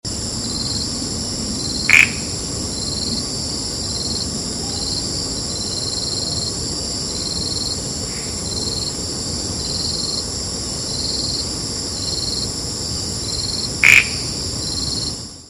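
polypedates leucomystax, Four-lined Tree Frog call; Thomson Forest, Singapore, 17 Nov 2016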